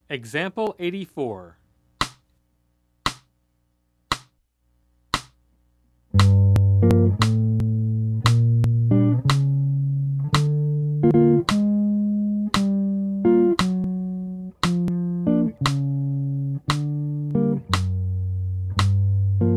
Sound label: Jazz